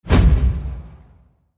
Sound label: Short Whoosh